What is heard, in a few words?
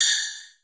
BELLS; Crypton - DrumBase5000; R70 KIT #1